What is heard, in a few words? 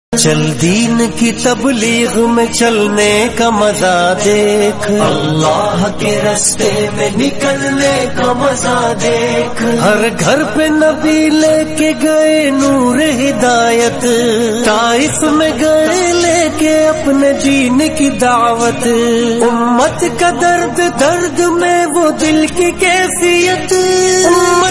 ringtone, naat